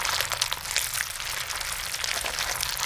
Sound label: Flesh Loop; Flesh Loop new 01